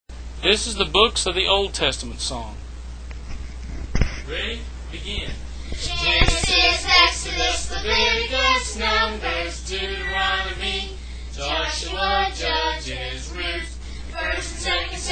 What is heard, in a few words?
Books of Old Testament Song